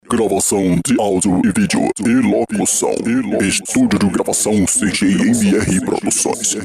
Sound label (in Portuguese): vinheta; cg mrproducoes